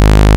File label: MP SAW; INIS; Vintage